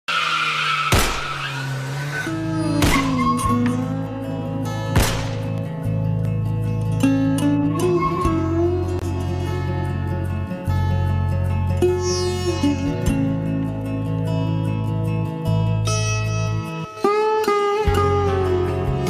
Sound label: background music sad music